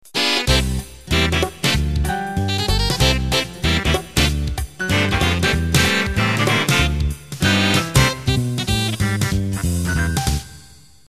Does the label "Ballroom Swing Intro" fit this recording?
Yes